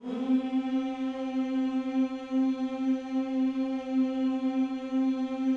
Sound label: HMMS C 4, GOSPEL HMM; Spectrasonics; Vocal Planet